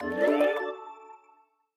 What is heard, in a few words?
charge wireless; Xiaomi MIUI-14